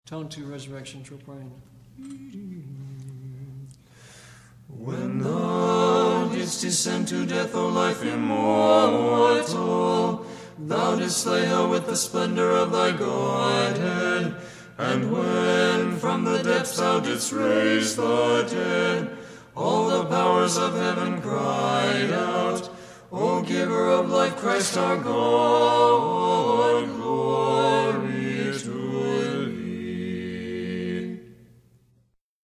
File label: Tropar Tone 2